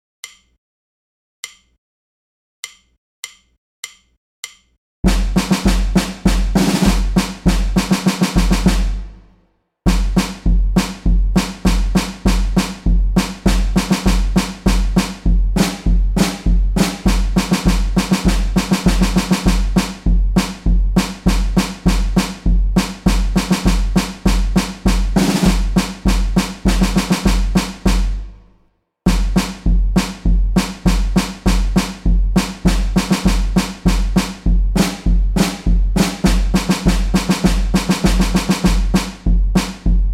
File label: band drum only; manhattan beach